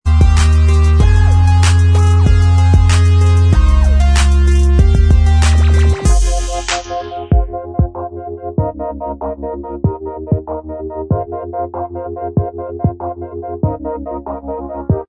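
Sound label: instrumental, backing tracks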